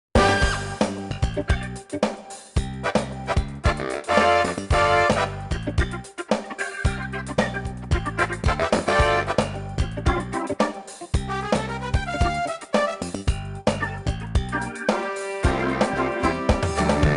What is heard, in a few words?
Victory; Kid Pix 3 Deluxe; Windows